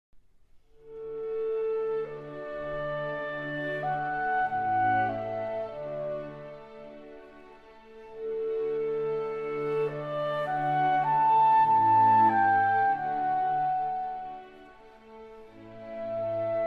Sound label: Adagio from Clarinet fragment; Mozart